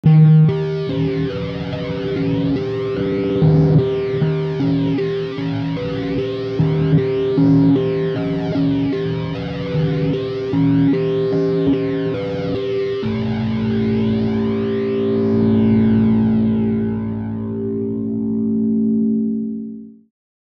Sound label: Demented Bells